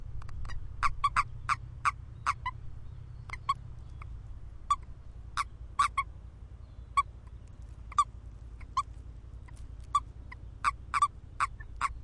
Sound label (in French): Crapeau commun